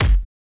Kick, Kickdrum; Equipped Music; Loungin House

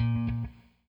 ROCK, Guitar

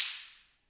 DRMSTCKS, wood; Spectrasonics; Heart of Africa 1; AF.WOOD

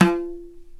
INDIAN, DrumBase; Crypton - DrumBase5000

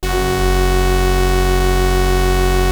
OB F2 -L, Rare Synthesizer; Hollywood Edge - Giorgio Moroder Rare Synthesizer Collection; OB 4 VOX.

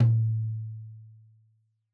Tom2 Stereo, Deeply Sampled Drums; SMDrum

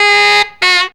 FUNKY SAX; Zero-G; Funky Elements

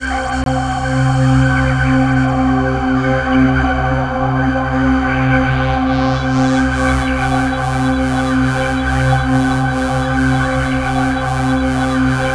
pad C3, ambient atmospheres; COBRA PAD

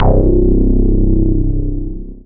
Dance Floor Bass, Multisample Basses

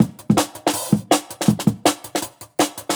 Jungle Breaks; Original Jungle Breaks

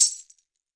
wrist, percussion; Best Service ProSamples vol.52 - World Instruments 2; MIXED PERCUSSION

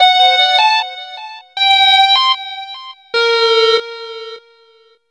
Riff, Synth Sequences

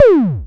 BOSS PERC SNTH, DRUMS PERC; Rubber Chicken Software Sample Bank

